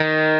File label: Arp.